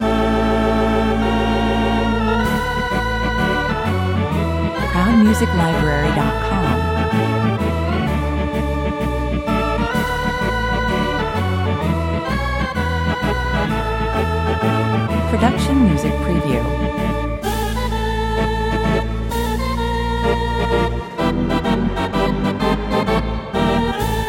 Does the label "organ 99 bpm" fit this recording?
Yes